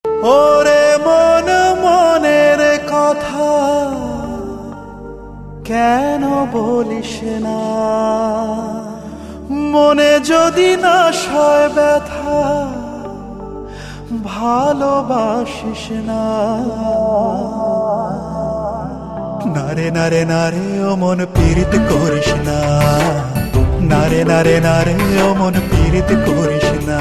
BENGALI SONG RINGTONE

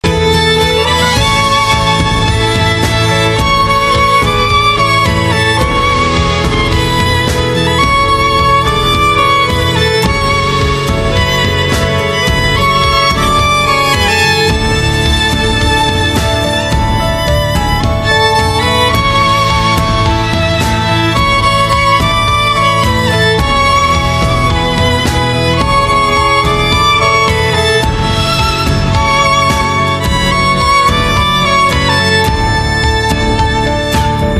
violin ringtone

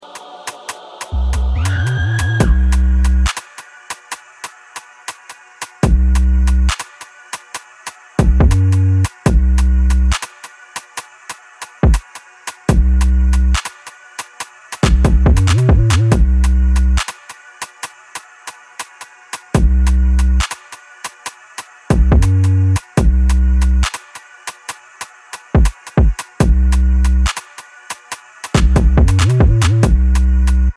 instrumental ringtone

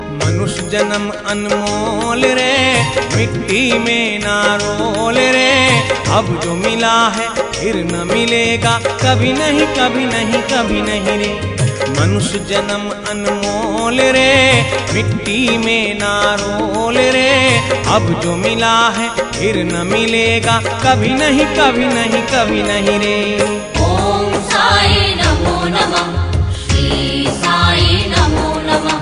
RINGTONE

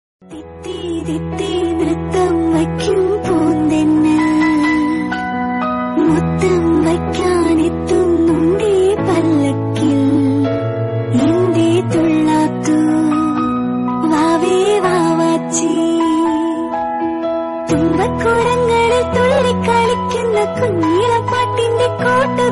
birthday songs Malayalam ringtone

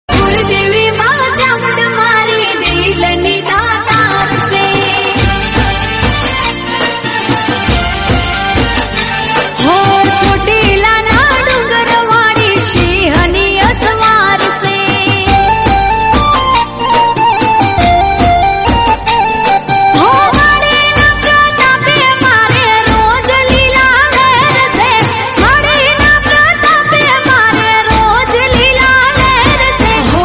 AARTI BHAKTI RINGTONE; CHAMUNDA MAA

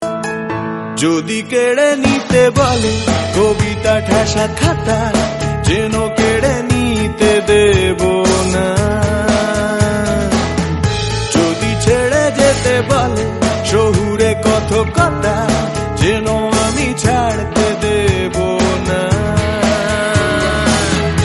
GAAN RINGTONE

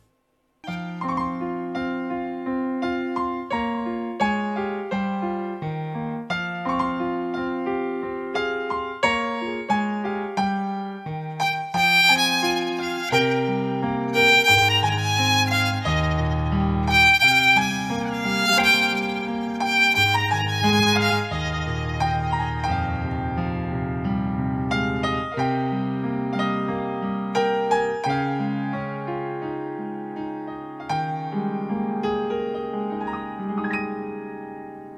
LOVE FAILURE RINGTONE

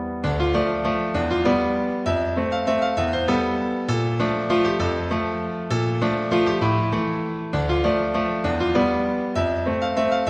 PIANO FOR LOVE RINGTONE

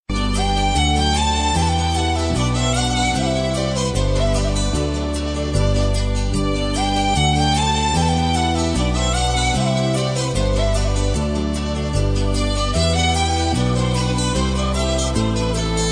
FLUTE RINGTONE; PAA MOVIE

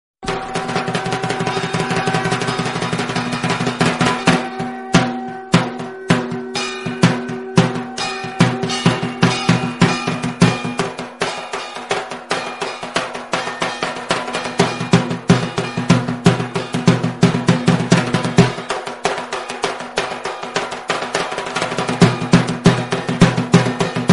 BENGALI DHAK INSTRUMENTAL RINGTONE

